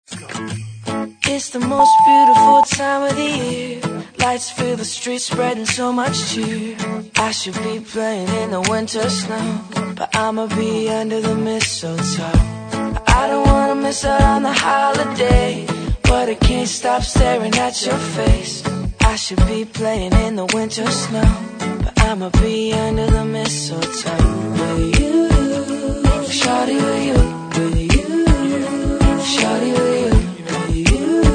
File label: Mistletoe